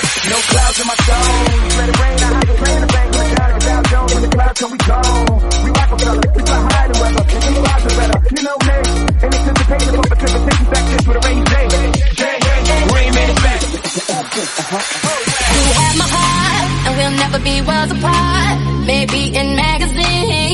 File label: Tech House 126bpm Bbm